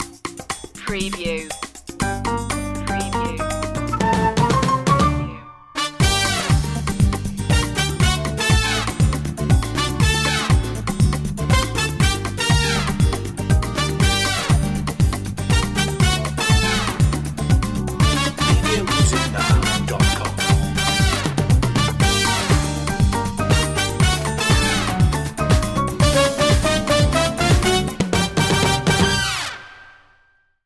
hot summer samba

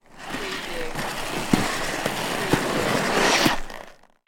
Rollerblade Skate; Sports & Games / Other / Misc Sports Sounds; sound effects bible

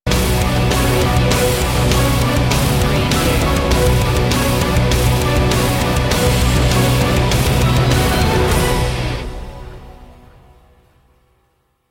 heroic anthem rock mix

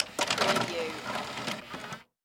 CD Player Turn Off; Electronics / CD / DVD Players

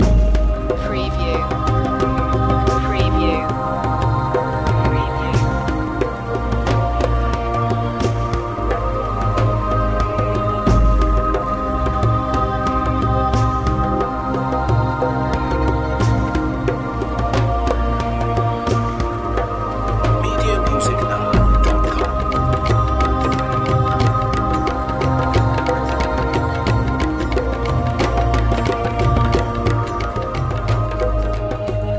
fantasy loop

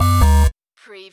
Servo Panel Sound - Denied

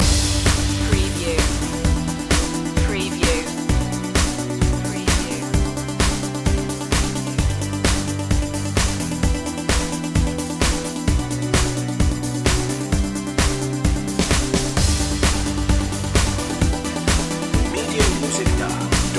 new life loop

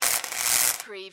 Arc Welder

